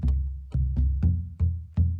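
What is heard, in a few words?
Calm Far, music; from dust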